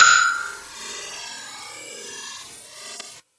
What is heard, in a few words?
mallet organ